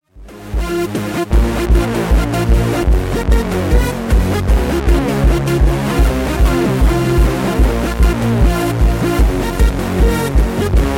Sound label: 11s of hardcore; Jackdaw